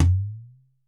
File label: TOM UP